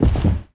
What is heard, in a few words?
Bodyfall Carpet; SFX Kit; Bodyfalls